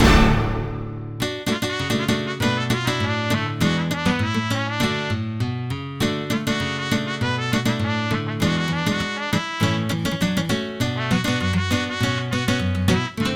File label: midi